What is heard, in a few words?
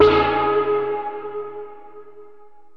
crystal; dota; meepo